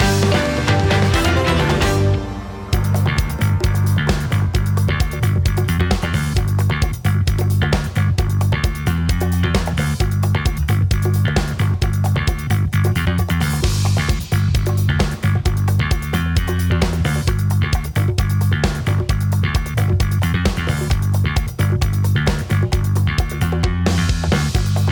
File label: Boss Battle Victory (Long); paper mario the thousand year door complete